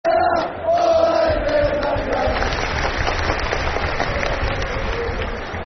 If You All Hate Villa Clap Yer Hands, Football Chants; Birmingham City Bcfc